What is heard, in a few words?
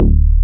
BAS; Best Service Gigapack 2